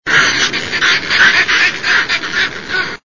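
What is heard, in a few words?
fulmar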